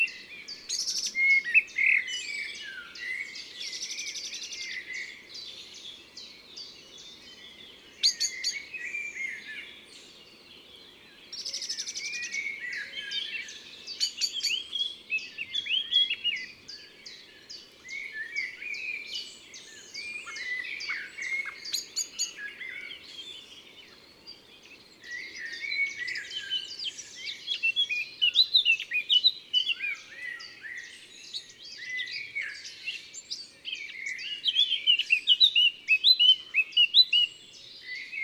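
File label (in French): Merle noir cris d alarme; Drome; Concerts Naturels de la Drome; Studio les Trois Becs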